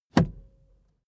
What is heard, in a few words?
car seatbelt fasten soft